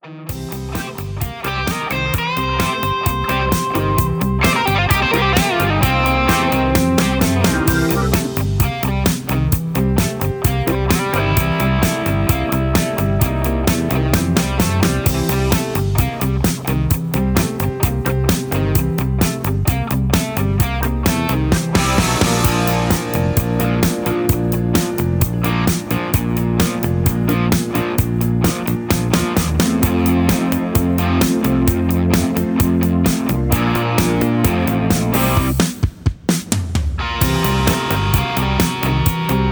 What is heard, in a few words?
Instrumental